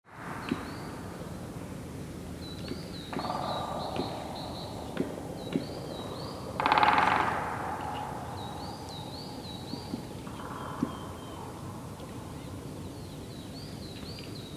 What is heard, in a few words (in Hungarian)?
siketfajd hangja